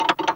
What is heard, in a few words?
CLOCKERS; Distored Reality